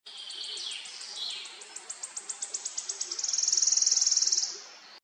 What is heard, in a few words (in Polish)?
swistunka, ptaki